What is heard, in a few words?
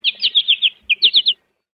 Amb Animals; Red Orchestra Ostfront 41-45